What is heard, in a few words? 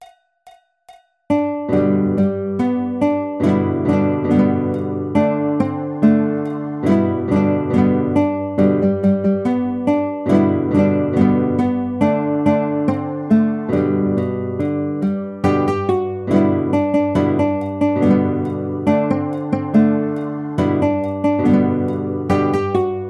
Buffalo Gals Guitar